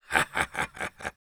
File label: laugh evil, es; Engineer